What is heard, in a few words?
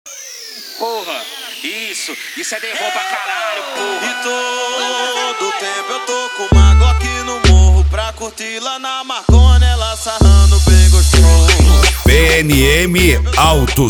funk